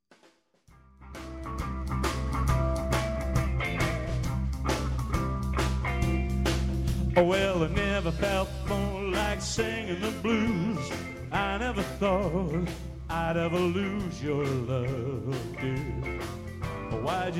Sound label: singing the blues